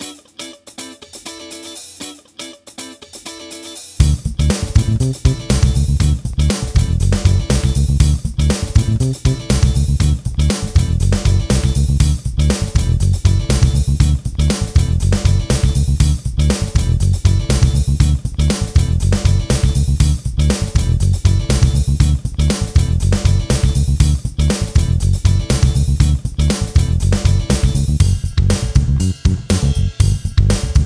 Basse Batterie 120 Bpm

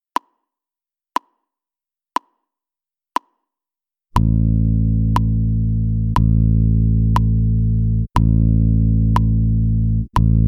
Blanche 4-4

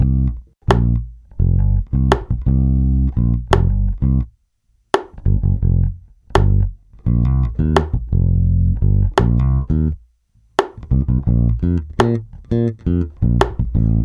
Basse Métronome 2 et 4 85

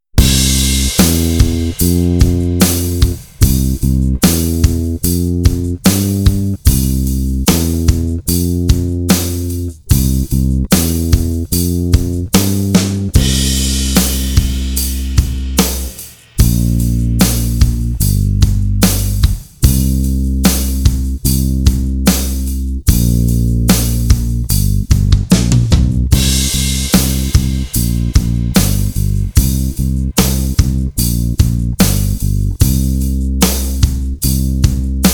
basse batterie

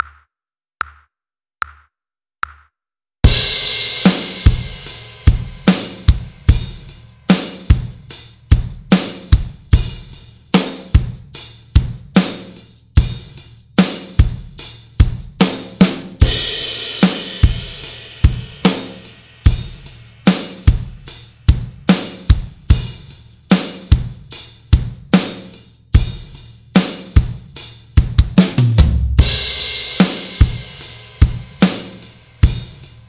ok rythme

